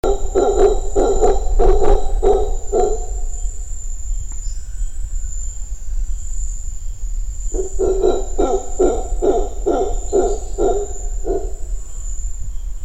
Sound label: mantled howler alouatta palliata, zvuki revuna obezyany